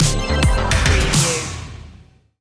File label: beat machine stinger